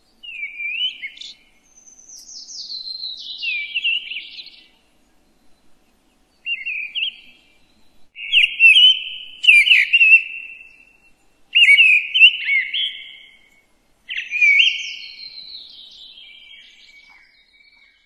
Vogelstimmenspiel Misteldrossel

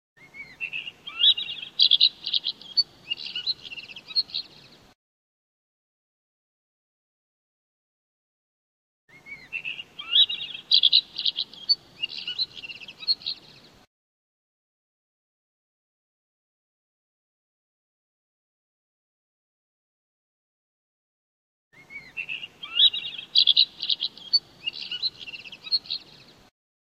Vogelstimmenspiel Neuntöter

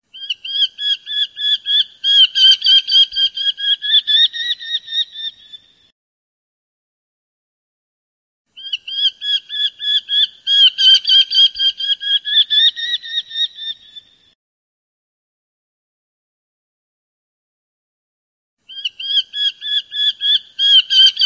Vogelstimmenspiel Turmfalke

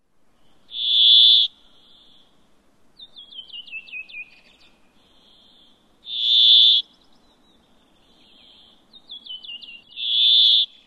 Vogelstimmenspiel Bergfink

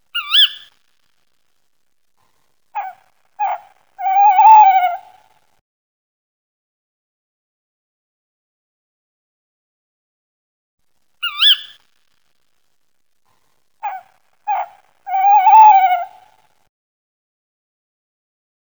Vogelstimmenspiel Waldkauz

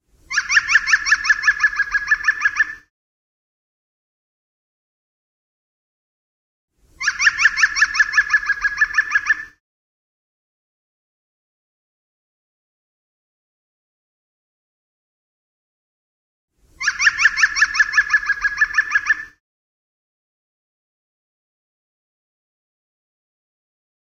Vogelstimmenspiel Grünspecht

